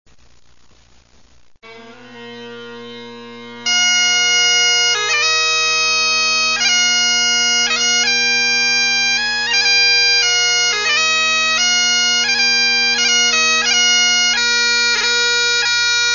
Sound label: trumpet